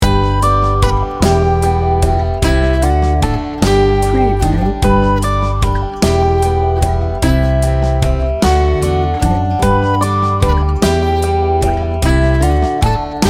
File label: mountain high loop